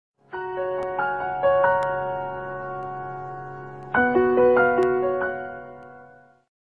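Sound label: piano message; ringtone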